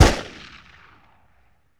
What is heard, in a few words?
Pistol Shot With Echo; Sony Pictures Sound Effects Series Volumes 1-10; Sony Creative Software